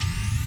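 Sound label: minigun power up; Allegiance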